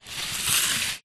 sport plastic sports cone short scrape on concrete; zapsplat